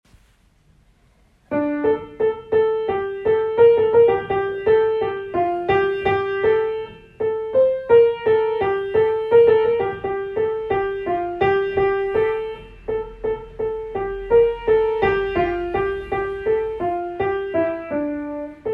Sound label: piano; citysings